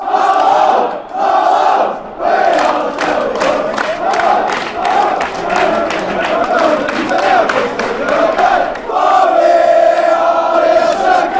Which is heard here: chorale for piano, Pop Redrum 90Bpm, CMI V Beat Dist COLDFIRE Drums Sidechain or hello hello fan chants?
hello hello fan chants